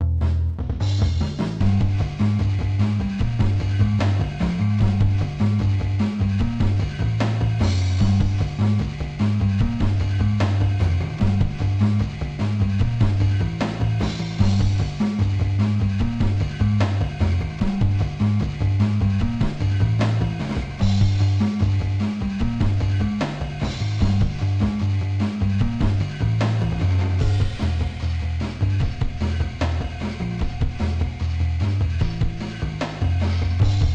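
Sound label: BGM Combat No Guitar; gamerip; X-Men Origins: Wolverine; NDS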